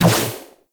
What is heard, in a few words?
Guardian Shell Impact; Warframe; Focus; Vazarin